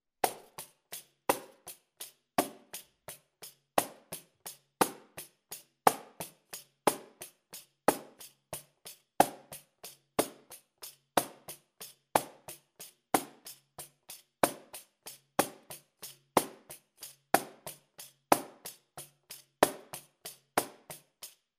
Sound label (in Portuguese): bossa nova slow, pandeiro